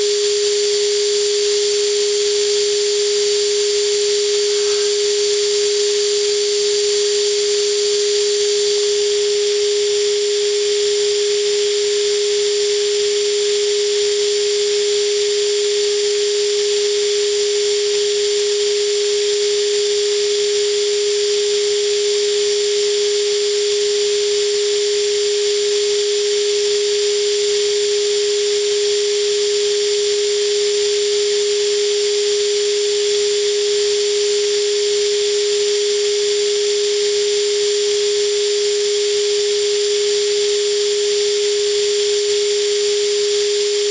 recording 10 Ghz microwave talk back signal that disappears when you monitor it; audacity